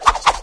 jump, headcrab poison; Garry's Mod; hl2

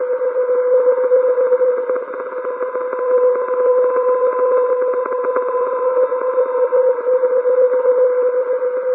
combine terminal loop, machines; Garry's Mod; hl2

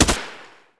SMG; BaboViolent 2

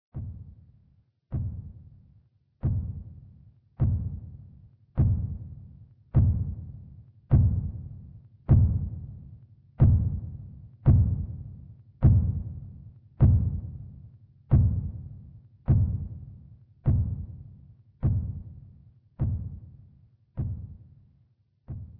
strider distant walk; Garry's Mod; hl2; streetwar

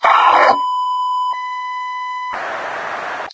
die, metropolice; Garry's Mod; hl2

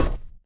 metal, footsteps run; Left 4 Dead 2

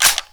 sniper military slide forward

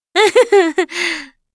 laughter

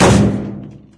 metal barrel impact hard; physics; Garry's Mod; hl2

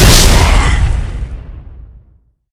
smoker explode; death; Left 4 Dead 2

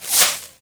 arrow pass by; Mount&Blade Warband

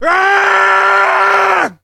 man yell; Mount&Blade Warband

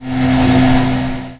construction element; Emperor - Battle for Dune

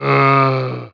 contaminator die; Emperor - Battle for Dune

